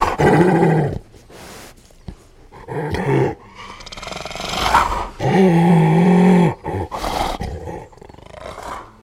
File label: Hyena Hoarse Call Gro